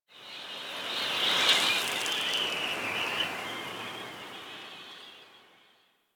Wind Gust Medium, Ambience Plains; Warframe; Eidolon Plains; One Offs

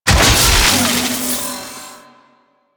Lightning Deactivate, Forest; Grineer; Warframe; Veilbreaker; Grineer Forest Thumper; Kahl

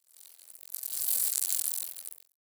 Insect Fly By; Ambience; Misc Outdoor; Warframe; Infested Eris

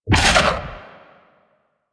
Fairy Dust Impact; DE; Warframe

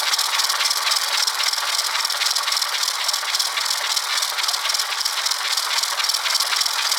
Crp Ship Repair Bay Cutter Loop; Ambience; Props; Warframe; Corpus Ship Remaster

